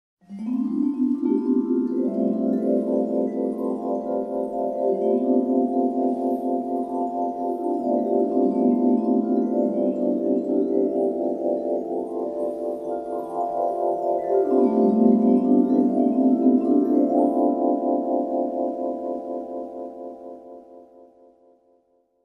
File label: Mallet dreaming sound effect extended